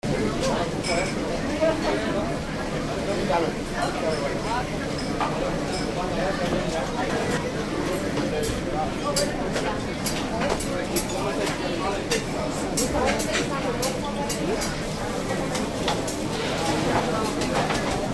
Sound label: grill diner crowd; ca san jose flame grill